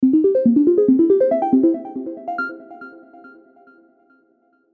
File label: death, bgm; asciinevrdie